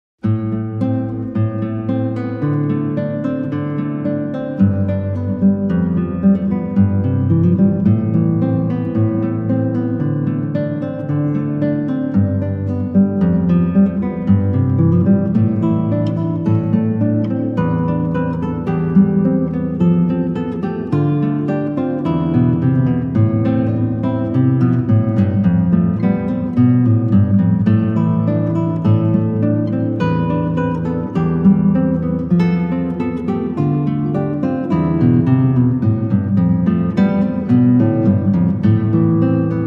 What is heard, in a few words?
classical guitar; hope road